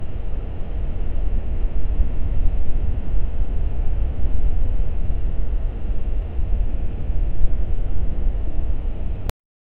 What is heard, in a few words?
whistling sandstorm wind; ambiance